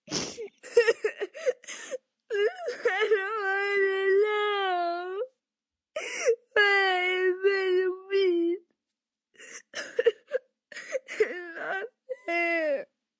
Crying Sniffle, Crying, 1 boy, long, sobbing, whining, sniffling, mumbling, human voice, kid; child, male